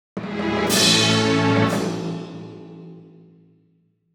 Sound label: Task Complete